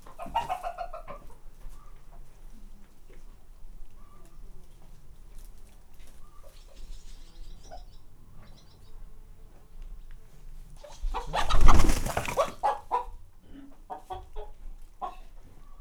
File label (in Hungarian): reppenés, magyar tyúk; parasztudvar